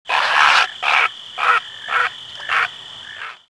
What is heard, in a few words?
Heron